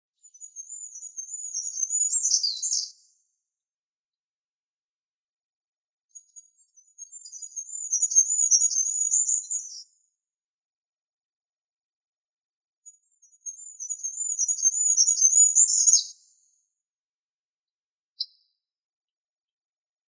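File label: goldcrest, regulus regulus; korolek zheltogolovii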